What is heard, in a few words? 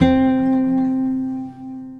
One Note Guitar, Placid Plucks; Ableton; Mood Reel